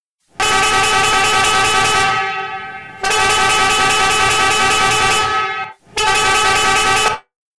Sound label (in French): son autobus italien trompe, klaxon